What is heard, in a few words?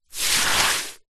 wet sand deep hand dig in